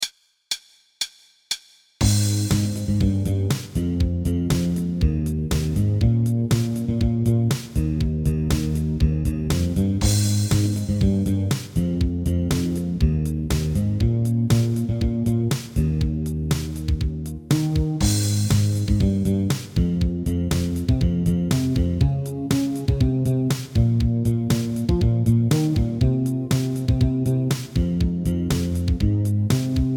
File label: goodbye train Bass